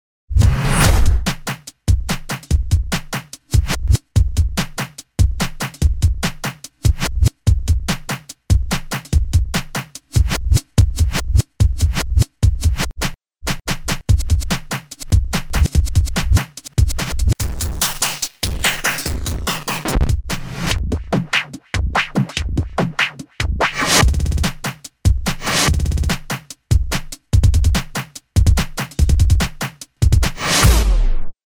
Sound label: bed changin it